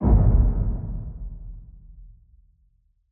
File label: Horror Low Boom; SoundsCrate